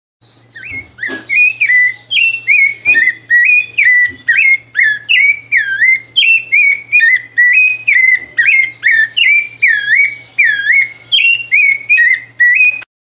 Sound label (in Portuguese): toque para celular passaros